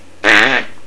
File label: toucan